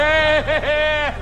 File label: eh hehe